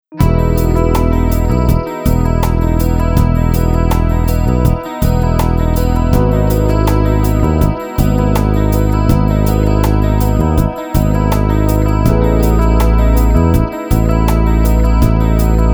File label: patch demo